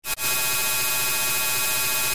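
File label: train stopping maybe; ff3; ff3us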